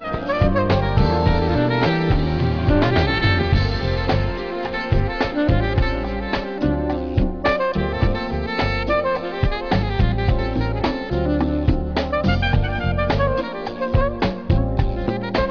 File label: jazz